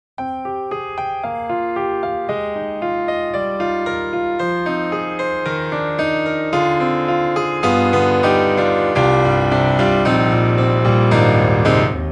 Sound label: Rosewood Grand; Orange Tree Samples; Evolution Rosewood Grand; The Professor